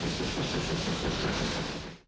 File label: Train Passing Idle; subway surfers city sound effects; Android, iOS